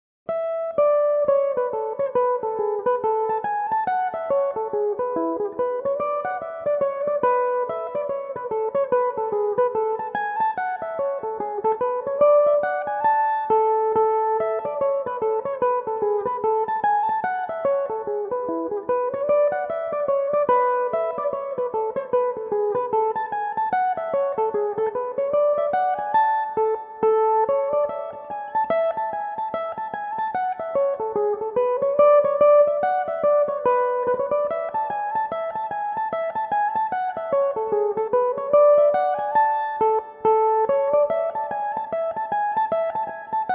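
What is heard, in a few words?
hornpipe A major, Irish mandolin; Gillespie's; Belmuse EM-190 electric mandolin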